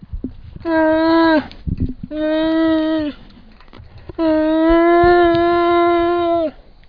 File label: bear sounds